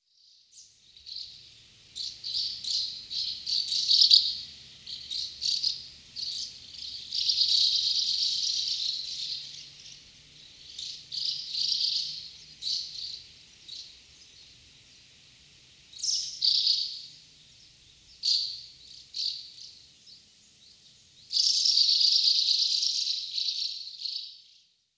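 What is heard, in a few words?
Asian House Martin, fuchu; iwatsubame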